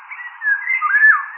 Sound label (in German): pirol